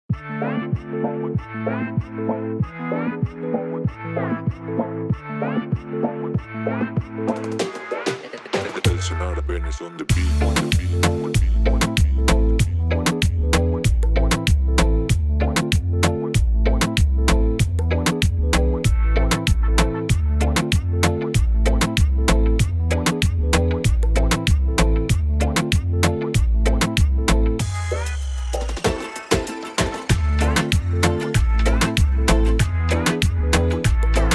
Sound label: So Cute Beat Instrumental